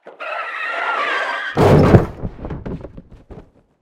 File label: The Impact